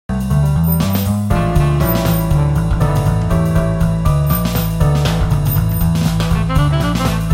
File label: bell earphones